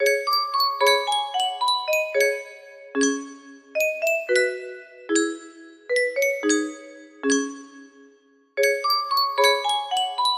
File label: Yunsheng music box lullaby